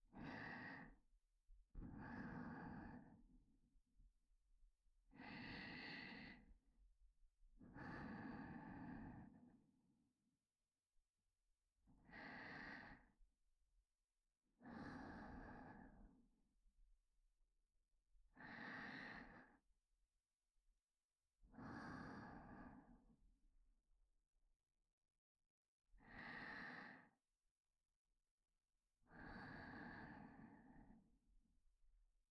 添い寝 ループ

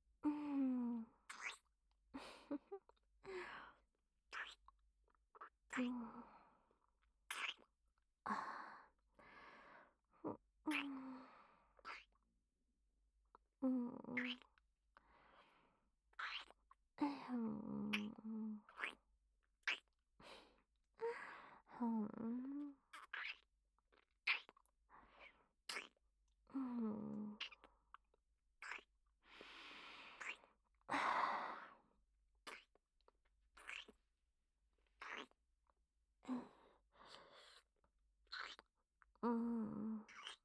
キス; アドリブ集

